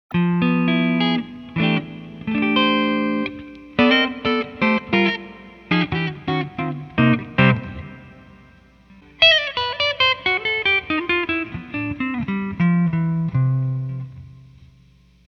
archtop electric; Silkyn Tube Amp

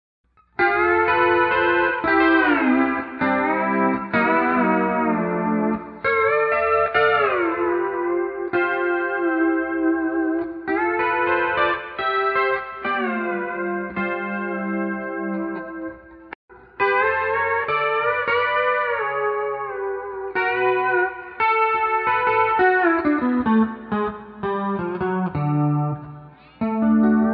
Chorus; ToneX Pedal

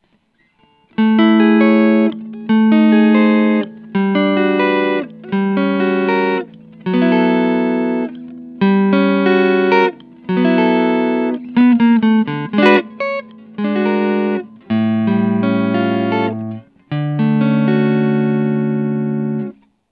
jazz combo, Boss GT-8

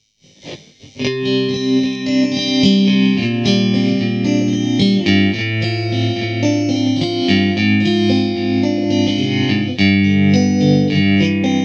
Dropped D, Boss GT-8

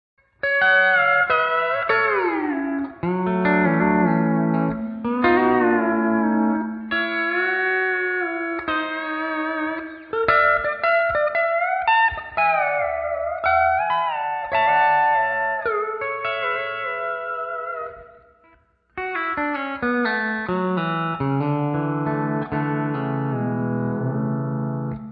Spring; ToneX Pedal; Spring Reverb

